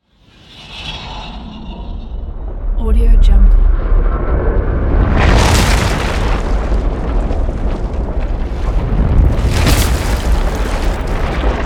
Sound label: elemental earthquake; ریزش بزرگ زلزله